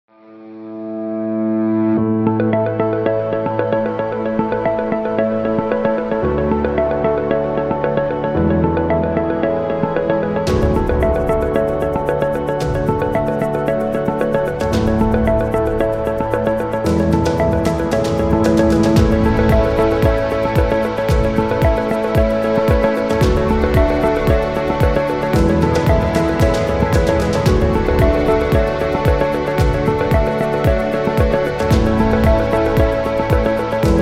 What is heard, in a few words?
Corporate Motivational; corporate music vol 10